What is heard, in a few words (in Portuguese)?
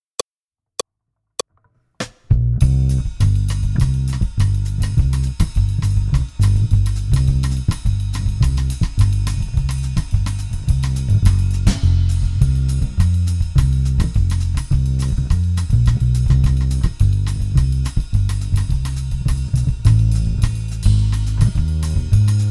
blues menor sem guitarra; Jazz Harmonia